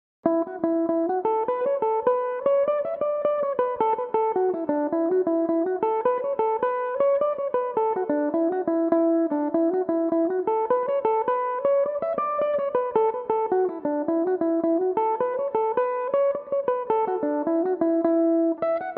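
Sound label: E dorian, irish mandolin, tunes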